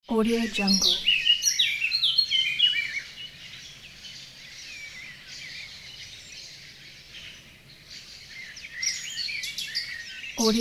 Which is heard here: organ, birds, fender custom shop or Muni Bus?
birds